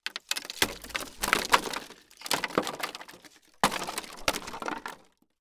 Crash Wood Debris On Wood Pallet; Crashes

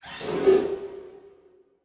Transition Whoosh Fire; Production Element

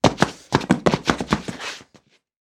Foley Footstep Human Scuffle Feet; Foley Footsteps

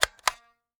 Foley Toy Gun Shotgun Cocking

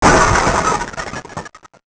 Transition Blast Distortion; Production Element Title Transition

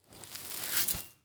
Impact Shovel Dump Dirt

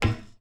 FOOTSTEP - Metal Plate Run Barefoot Male; Barefoot on Metal; Timothy McHugh; GDC Game Audio Bundle

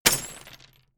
Crashes Ceramic Glass Debris On Wood; AdobeCC

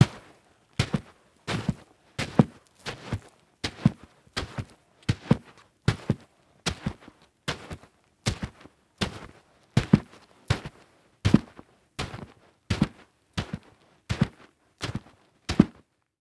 Foley Footstep Work Boots Dirt Debris Walking; Foley Footsteps

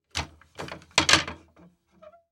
Household Hangers Movements

